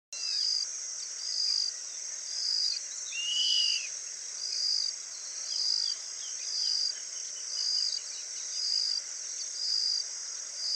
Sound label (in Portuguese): guaracava de penacho amarelo